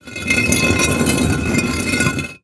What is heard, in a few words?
Foley Movement Cinder Block Slide On Cement